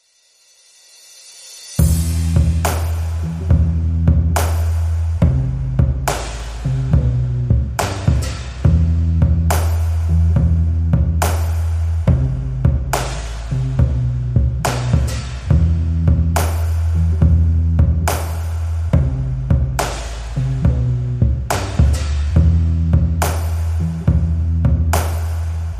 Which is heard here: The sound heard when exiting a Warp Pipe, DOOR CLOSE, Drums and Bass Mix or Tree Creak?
Drums and Bass Mix